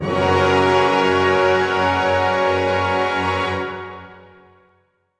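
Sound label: orchestra; Masterbits - Switch FX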